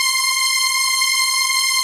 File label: PAD, Dance Mega Synth